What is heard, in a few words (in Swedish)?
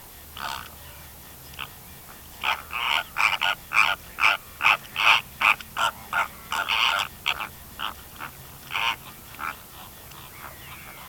storre flamingo